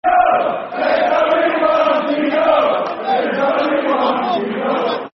Football Chants (Soccer Song); One Keano; Tottenham Hotspur Thfc

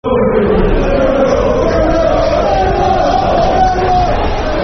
Slower Football Chant (Soccer Song); Millwall, Millwall 2; Millwall Mfc

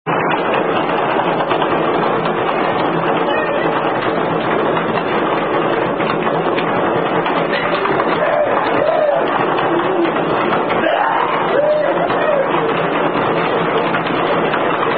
Signs Indian Drinking Game; Thumper

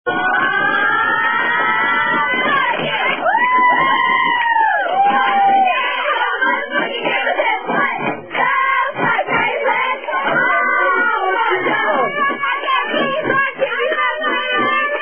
Drinking Game; What The Fuck Drinking Game

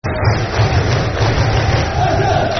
(Clap) Everton, Football Chants; Soccer Songs

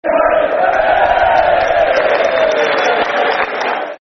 Give Us A Wave, Football Chants; Derby County Dcfc

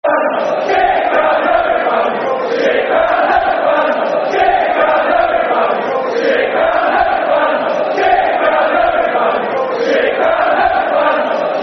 Shit Ground, No Fans, Football Chants; Derby County Dcfc

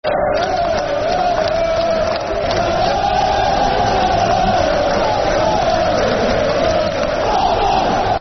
Football Chants (Soccer Songs); Der, Der Blackburn; Blackburn Rovers Brfc

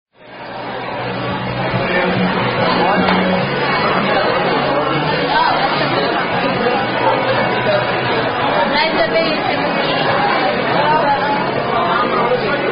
Happy Birthday (Standard) Sung By Wait Staff

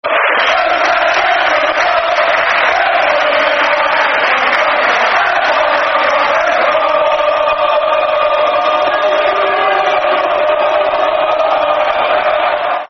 Football Chant; Who's That Team They Call The Arsenal

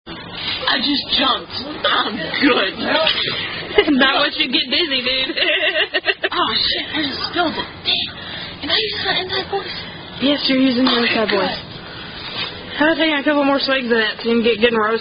Drinking Game; Viking Drinking Game; SCA Drinking Game

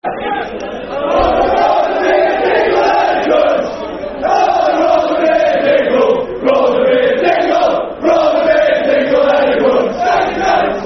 Football Chants (Soccer Song); Rather Be A Dingle; Wolves; wolverhampton wanderers; fanchants

